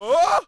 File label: pre fall, Rider